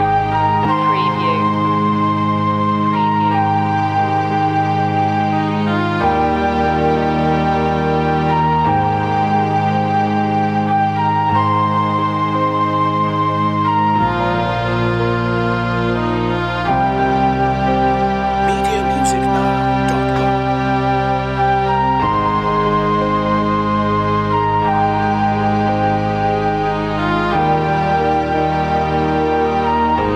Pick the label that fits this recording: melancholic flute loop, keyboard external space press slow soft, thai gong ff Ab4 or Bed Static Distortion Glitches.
melancholic flute loop